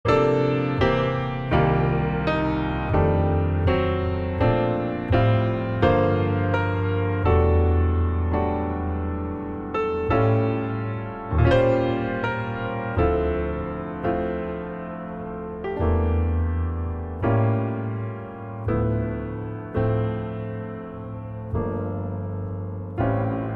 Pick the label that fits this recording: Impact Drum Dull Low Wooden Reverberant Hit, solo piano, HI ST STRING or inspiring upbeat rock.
solo piano